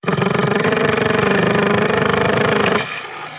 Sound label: Raptor breath; 1916 der unbekannte krieg gamerip